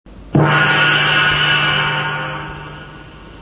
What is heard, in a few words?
GONG